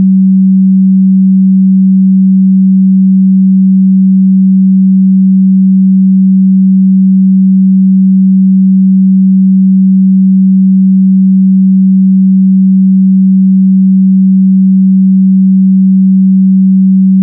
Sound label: 190Hz stereo